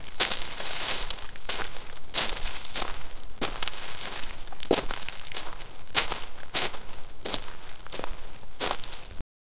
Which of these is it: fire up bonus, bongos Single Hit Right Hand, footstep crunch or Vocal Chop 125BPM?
footstep crunch